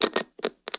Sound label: Multiple two-way radio button clicks.; Two-Way Radio Squelch, Radio Static